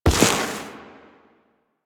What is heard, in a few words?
Step Snow, Foley, Footsteps; Enemies; Warframe; Duviri; Dax